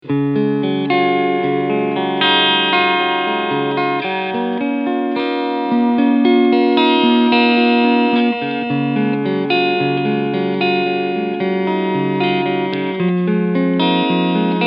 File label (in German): E Gitarre Clean mittlerer Humbucker; Kramer Guitars Jersey Star